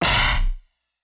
general hit

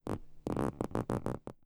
computer talk

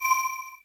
Cancel; GameJamDungeon; Zennysoft

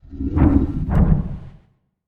ENEMY Horse Face ATK; GameJamDungeon

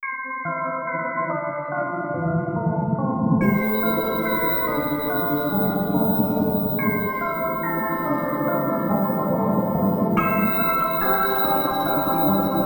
worldmap

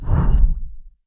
enemy kick; ambassador; GameJamDungeon

